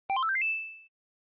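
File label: Game Boy Action Item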